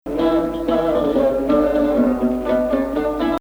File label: Bayati, maqam